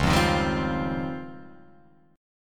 C sharp Major Sharp 11th, Guitar Open F; C#M#11; strummed; tuning=Open F; guitar chord

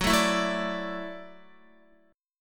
Gb Minor 7th Sharp 5th, Guitar; strummed; JGuitar

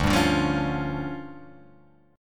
D Minor Major 7th Double Flat 5th, D

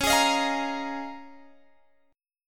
Db 6th Add 9th Db down Guitar Open G

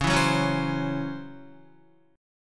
C sharp Minor Major 7th Double Flat 5th Guitar; C#mM7bb5; strummed; JGuitar

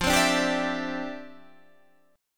D Minor 11th F

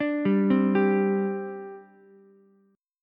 C Suspended 2nd C Ukulele; arpeggiated; 7,7,8,10; JGuitar

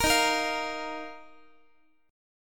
Eb Suspended 2nd, Guitar; Ebsus2 strummed; JGuitar

